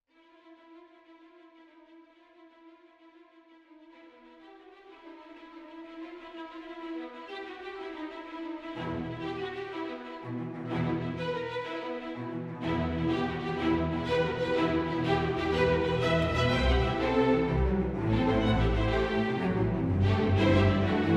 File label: Ambient; Queens Gambit